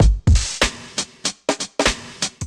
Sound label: Groove 83bpm, Drums; MIDI Clips; Around The Corner; Ableton; Factory Packs; Chop and Swing